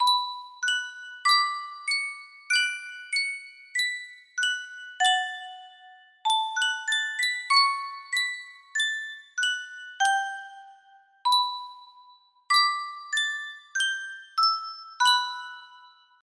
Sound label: yunsheng spieluhr, music box melody